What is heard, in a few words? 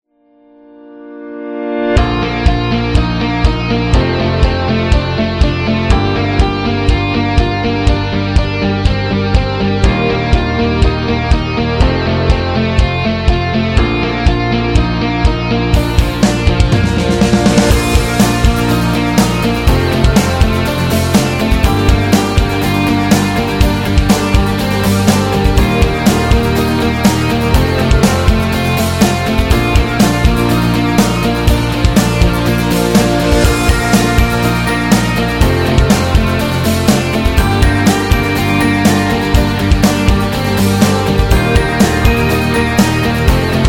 Rock Happy, ukulele